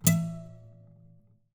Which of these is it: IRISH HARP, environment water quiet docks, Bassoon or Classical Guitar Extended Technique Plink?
Classical Guitar Extended Technique Plink